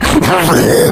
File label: rage at victim, infected